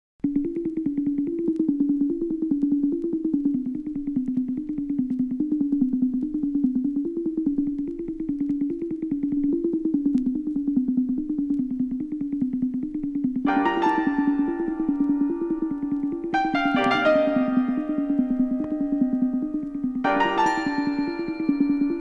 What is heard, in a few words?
smoothie; Astral Sounds